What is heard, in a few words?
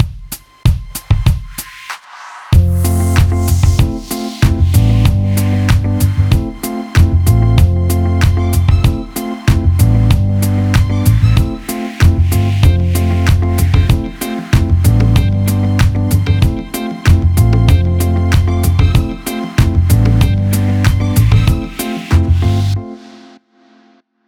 coffee music Chill 95